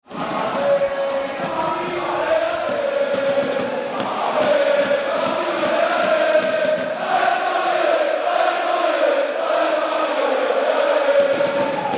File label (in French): allez paris allez, chants; football; psg